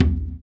surdo; ILIO; Ethno Techno

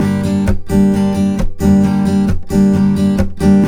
Blues Guitar Samples, RIFF; ILIO; Fretworks

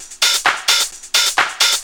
drum 130 hihat, Techno Trance, Drumloops

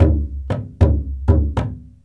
WYANG, Heart of Asia; Spectrasonics - Heart of Asia CD2; W.KULIT

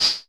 HH, Vermona Mono15 Drums

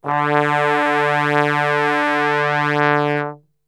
D 1 Brass Super Section; Best Service; TRMBSTRGHT; 90 s Sample CDs

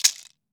maracas; AKAI S-Series CD-ROM Sound Library VOL-5

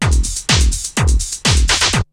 LOOPS, TECHNO; Syntec; Wall of Sounds; TECHNO-BOX

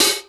HAT, Hip Hop; PlatinumPhattCD1; E-MU Producer Series Vol. 8 – Platinum Phatt

